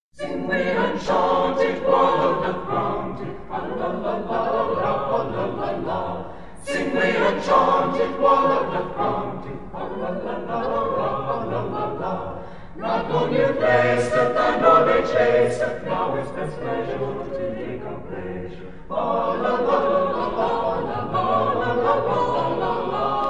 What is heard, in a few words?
madrigal Acappella; Sing We And Chant It; Thomas Morley; USC Chanteclairs -Pgh